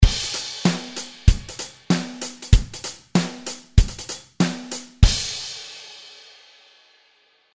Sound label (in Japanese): ハイハットエッジゴースト